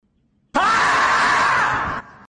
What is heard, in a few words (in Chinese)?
土拨鼠啊叫声音效